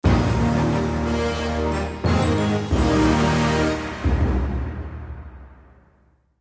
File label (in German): Medaillen Fanfare; Ballerburg